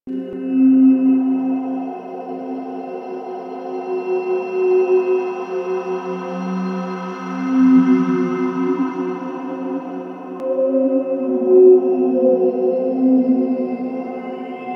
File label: Connector, Ambience; Warframe; Clan Dojo